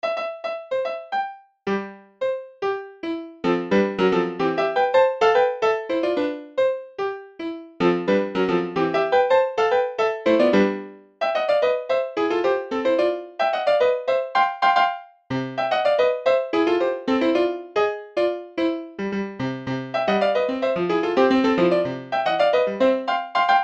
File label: Piano Concerto